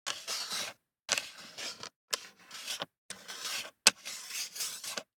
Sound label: DICE on Plastic, Slide, Standard, 3 Three Dice; Samples; ArticulatedSounds; DICE Sfx Party (Extended)